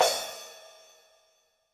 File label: Crash Cymbal Light; YAMAHA RM1X